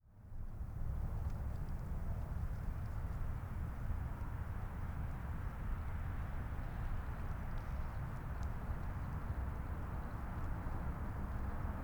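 Exterior Ambience Empty Open Field Distant Gunshot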